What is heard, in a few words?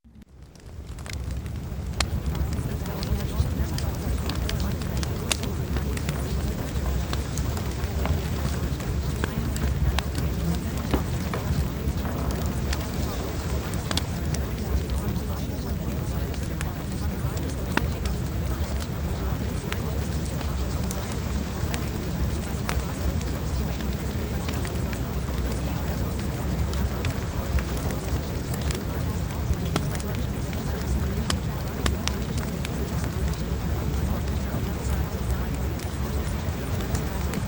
Fireplace